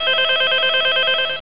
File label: ring in; ZTE R90; RingTone